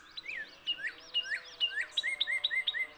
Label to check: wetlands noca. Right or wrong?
Right